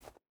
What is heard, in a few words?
footstep boots snow jump start; Rust